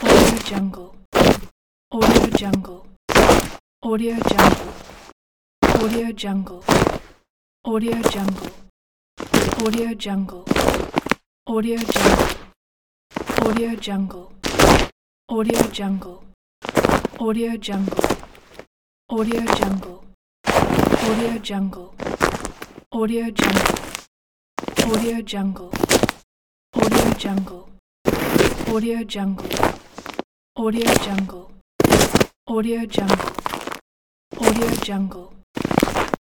snow steps

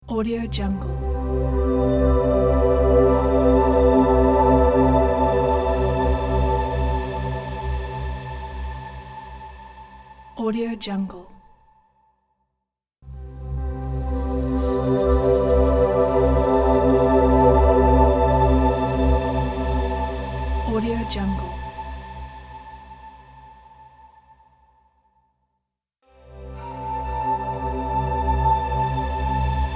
ambient meditative logo

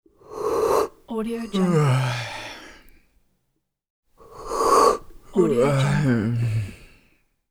yawn

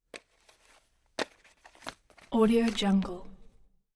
gun holster

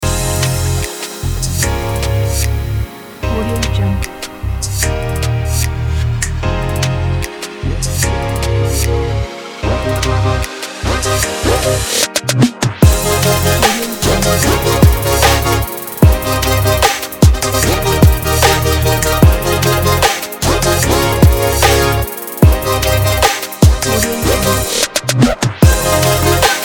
future bass up

